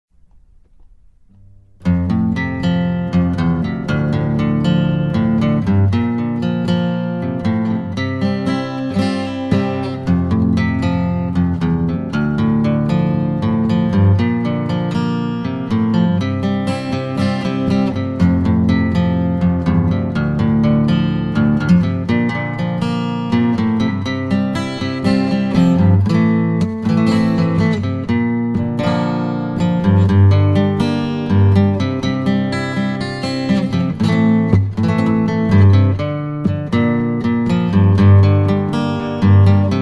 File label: Rain solo guitar